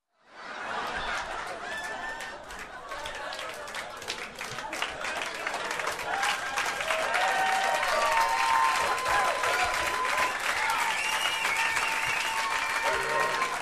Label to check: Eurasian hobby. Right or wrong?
Wrong